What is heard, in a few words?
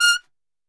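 F4 trumpet shot, Brass Super Section; Best Service; TRP SHOT; 90 s Sample CDs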